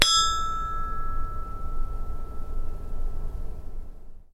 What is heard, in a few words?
chinese bronze bell front; sackler